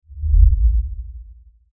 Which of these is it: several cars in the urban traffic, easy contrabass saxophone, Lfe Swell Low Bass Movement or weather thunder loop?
Lfe Swell Low Bass Movement